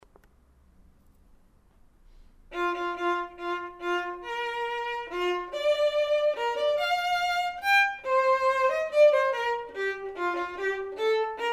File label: vln perf; Building Technique with Beautiful Music, Book 1 Violin; applebaum